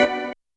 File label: Organ Stab L, YAMAHA RM1X